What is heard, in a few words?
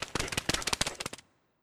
Skell Dog Run